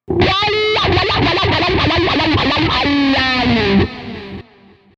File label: Voice, wah; v-wah